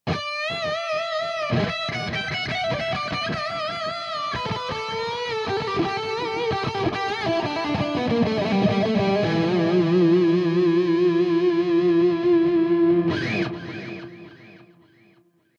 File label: effects; ENGL